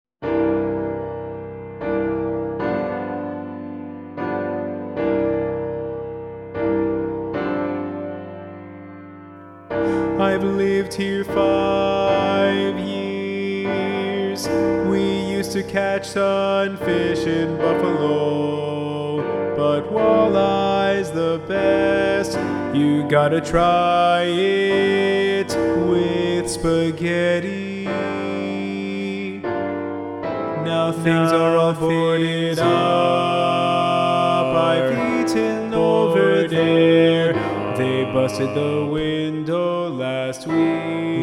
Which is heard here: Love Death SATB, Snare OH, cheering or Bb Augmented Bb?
Love Death SATB